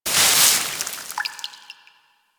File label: Ocean Door Open Drips; Warframe; Grineer Ocean